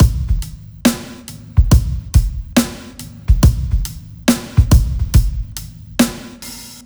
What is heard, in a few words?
SloMo Beats, Loops 140 BPM